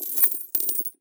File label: coins change metal cash in